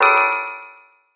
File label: Mascot pain; Fight Knight